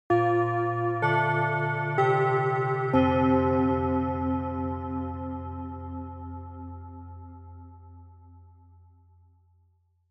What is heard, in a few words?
Japanese School Bell (Short)